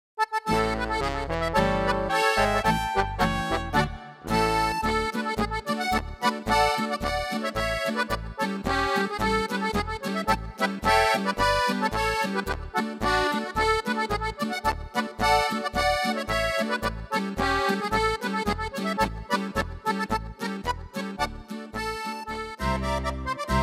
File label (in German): volksmusik medley